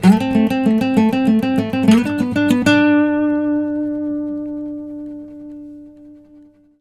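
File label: baritone lick 132 D5, Blues Guitar Samples; ILIO; Fretworks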